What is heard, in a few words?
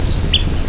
Pip, Empidonax alnorum; Passeriformes; Tyrannidae